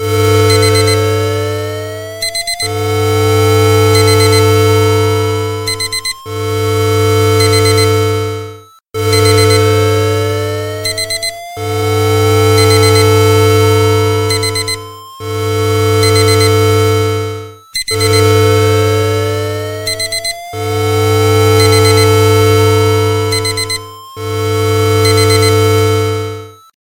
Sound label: Alarm Clock; HTC1